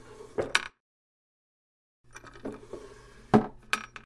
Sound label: Drawer opening